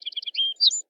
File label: Tweeter; Android P Ringtones